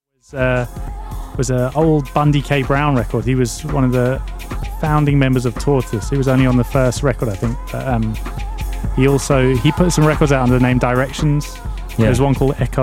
percussions water cannon

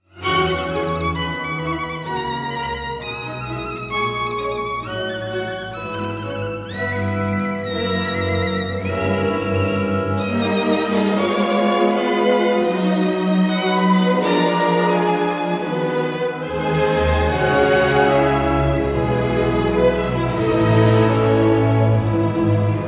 concerto in B minor for violin and orchestra; op 61

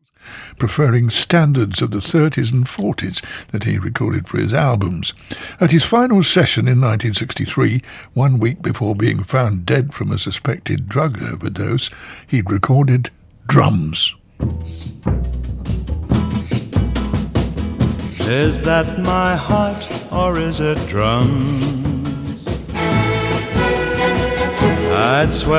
drums

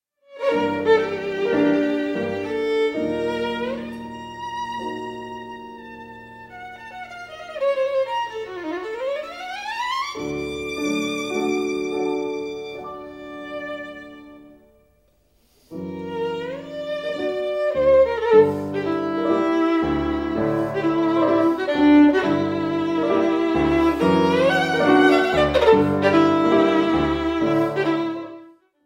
tambourin chinois for violin and piano; fritz kreisler

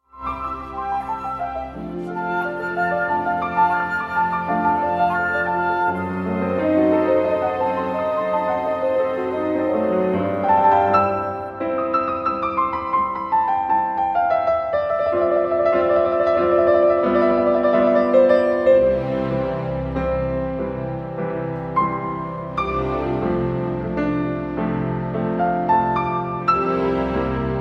piano concerto, andante, C major; Wolfgang Amadeus Mozart; 2nd movement

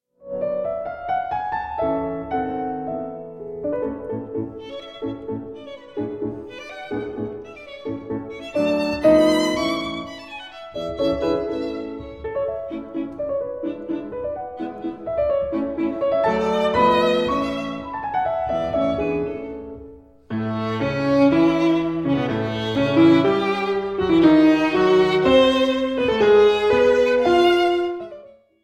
sonatina for violin and piano in d major; franz schubert; d 384

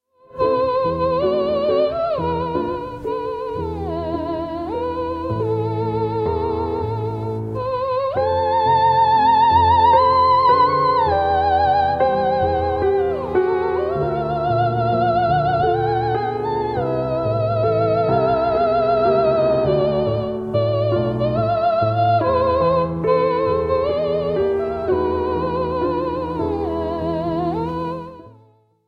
dance for violin and piano; Liebesleid; old viennese dance no 2

